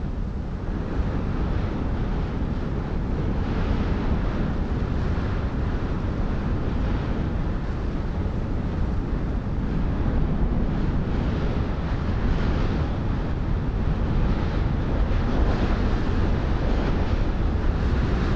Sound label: ambient battle; World Of Warships